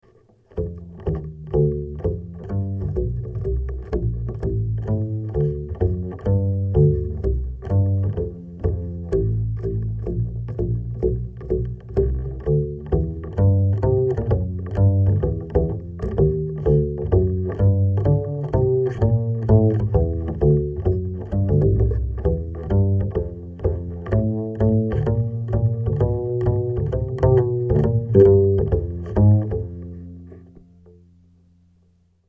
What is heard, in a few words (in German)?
Klemmpickup